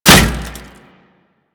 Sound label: Bullet Impact Metal No Whizz; Warframe; Bullet Impacts